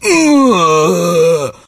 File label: zombie take damage